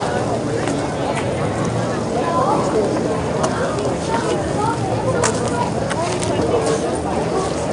LARGE CROWD; 1000fx

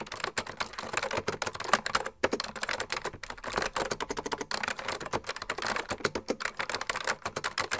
KEY TYPE, KEYBOARD TYPING; 1000fx

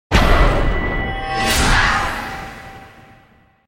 Eerie Voice with Metallic Second Hit; Hollywood Edge Sound Designers Tool Kit

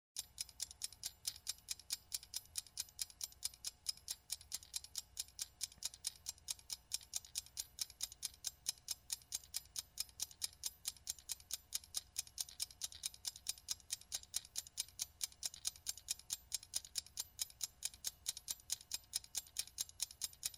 Kitchen timer; SOUND EFFECTS1

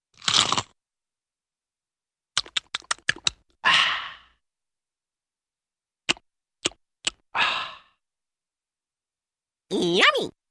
Big Crunchy Bite Lip Smacks & Vocal 'ahhh'; Hollywood Edge Cartoon Trax

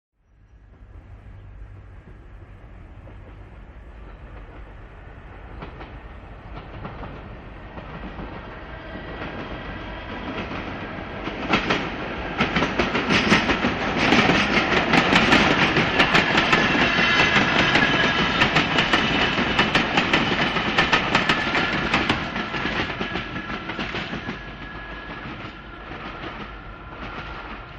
Train By (Medium) Electric Train Medium By; Hollywood Edge 3-D SFx

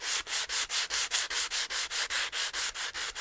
SNDPAPER, SAND PAPER; 1000fx

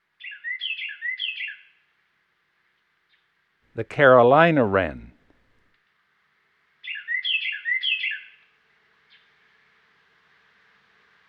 Carolina Wren; Dan Gibson; Wildlife Identification By Sound

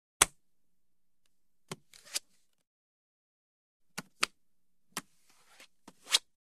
Card Playing Card Flip & Grab; Hollywood Edge Foley Sound Library

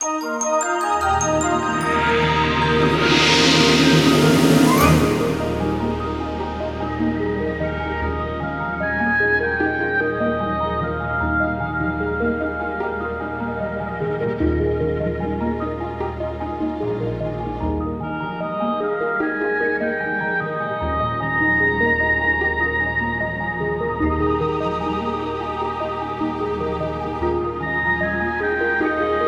Ident

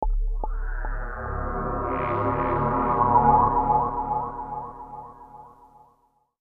Bass C#; Beds

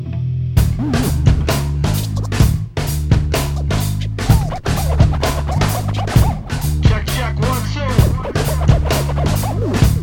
Shouts

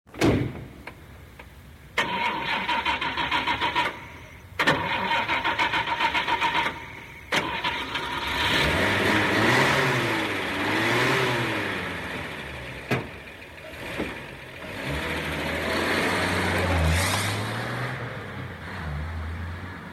Car Sequence; SOUND EFFECTS1

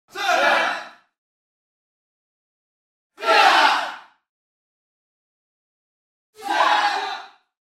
Class Yell 3X, Martial Arts and Human Impacts; Hollywood Edge Martial Arts and Human Impacts

